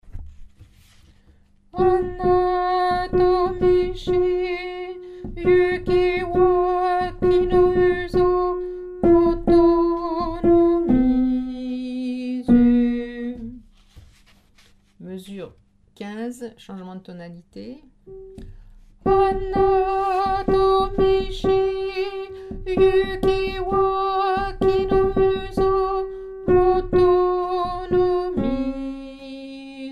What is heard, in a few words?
mezzo